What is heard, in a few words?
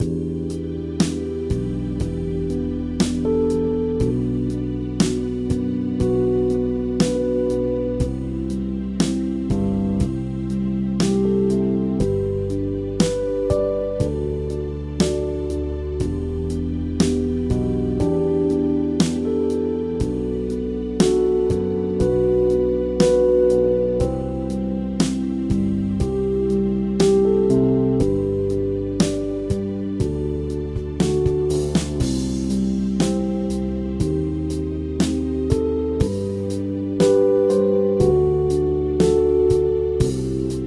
Generic Sad Loop; Riddle Transfer 2